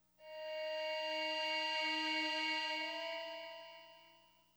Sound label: SYN, Hip Hop and R&B Drumloops; Best Service; ProSamples vol.01; AKAI